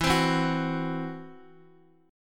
E Suspended 2nd Sharp 5th E, Guitar, 4F,4A,5C,5G,5C,6E; x,x,4,5,4,2; strummed; JGuitar